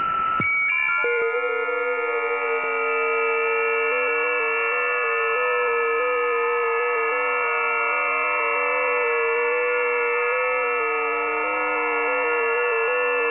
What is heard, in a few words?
websdr test, ft8; ft8 lib; rpitx